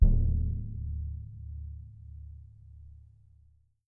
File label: BD MP, Percussion; Miroslav Vitous - Percussion & Harp